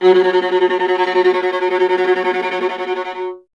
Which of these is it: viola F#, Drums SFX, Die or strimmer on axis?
viola F#